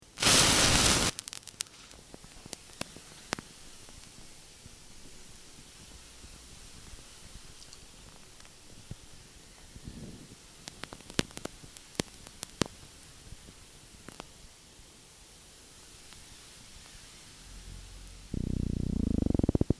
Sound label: air piece